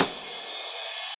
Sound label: cymbal